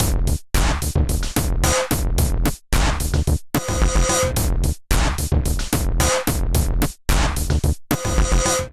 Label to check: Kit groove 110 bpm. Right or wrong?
Right